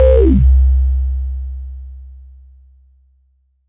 ppg sustained acid c2, multisampled acid synth; Jovica

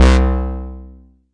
mbrute syn, Arturia MiniBrute

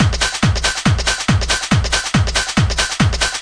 140 shaketastic, drum loops and breaks

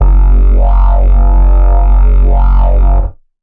synth bass wowow; multisampled; synth keys

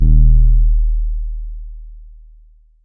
trap bass; 7rystan Trap Bass

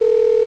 one phone ring; Sound FX Real Life

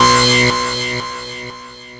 Synthesizer Middle C Notes, Lead

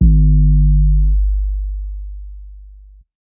Less 808; drums single hits; Khezie 808s

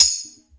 tamb; Western and Latin Percussion; single hits

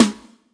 BRUSH SLAP, Roland TD 7; DRUM MACHINES

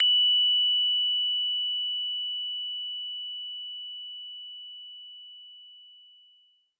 bell instrument note; Multisampled Bell Instrument

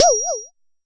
Jomox MBrane, drum machines; Jomox MBrane 11

